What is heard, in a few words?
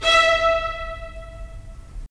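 strings violins, right; Roland - LA Composer vol. 4; 90 s Sample CDs; Violins Sh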